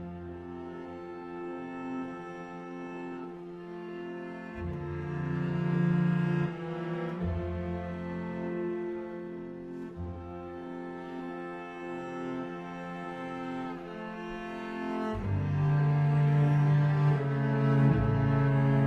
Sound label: cello